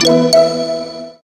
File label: win; Amatic; Book of Fortune